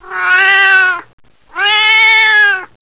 miau

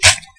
m203 close, weapons

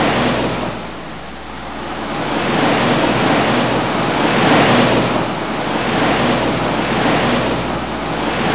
car pass

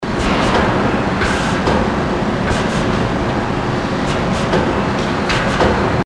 tech ambience steelmill

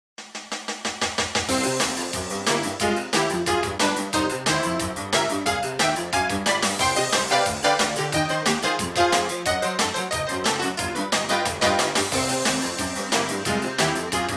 Music

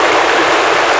gush

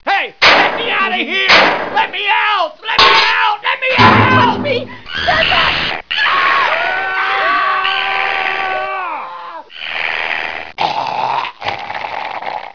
storage horror

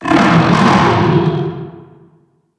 alien

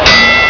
bell

